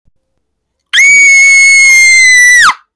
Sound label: happy scream